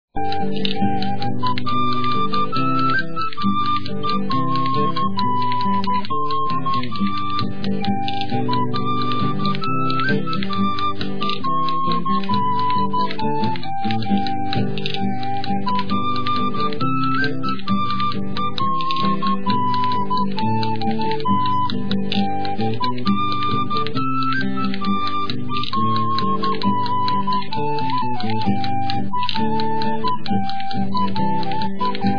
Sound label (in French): bourree mi